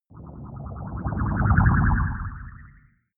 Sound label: whoosh storm twitter